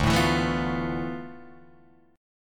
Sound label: D Minor 6th Add 9th, Guitar, DADGAD